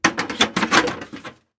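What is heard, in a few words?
knocking with construction tools during renovation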